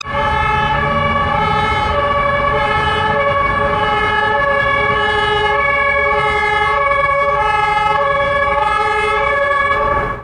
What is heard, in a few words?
horn